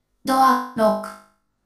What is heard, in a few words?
door lock